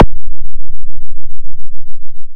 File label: Hit